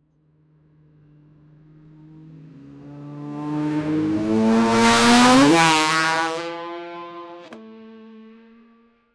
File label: fast racing car with